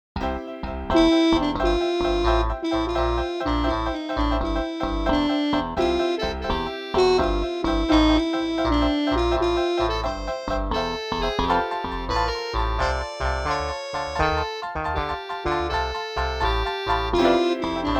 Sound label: MIDI blues